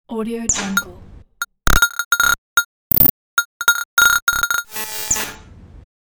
sparking lamp bulb

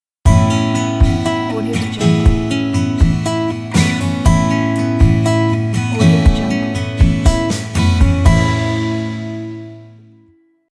mellow acoustic strumming bumper

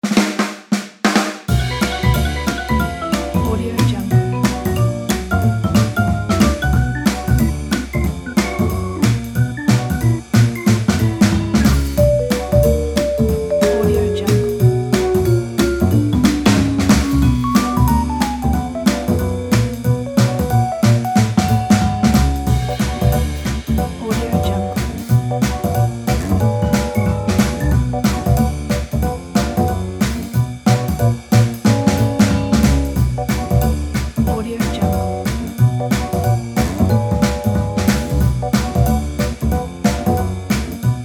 retro jazz

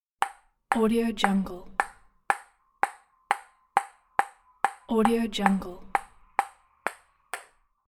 ping pong ball

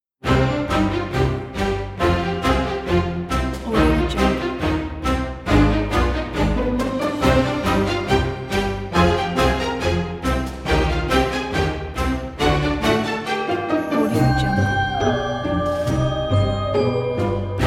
halloween at christmas; melodrama

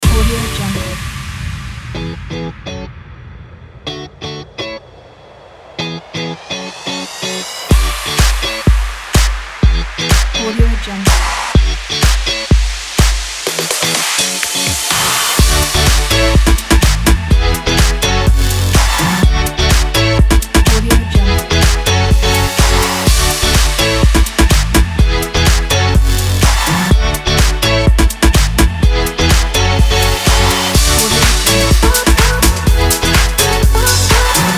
for dance

